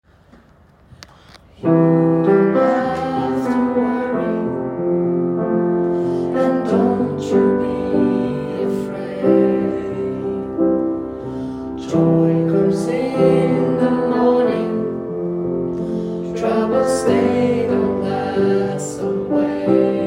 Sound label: alt -, gospel; kongsberg; kor; gospel for alle